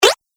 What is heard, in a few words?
Arcade Game Insect Jump; Video Game Sound Effects; Retro Inspired Sound Effects - Think Nintendo, Atari, C64